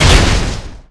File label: ATTACK SHOT